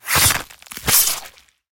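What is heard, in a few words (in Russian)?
разрубающие сухие ветки звуки ножа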